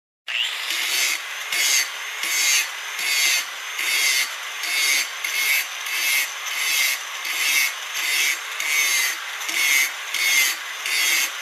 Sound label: Construction